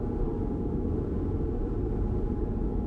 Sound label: Warp; blue archive